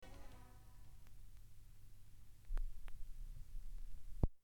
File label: De Crackler, vinyl